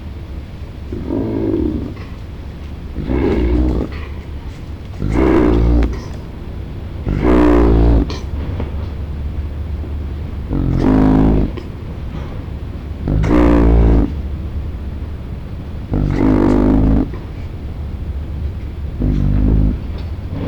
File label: hyena bear bone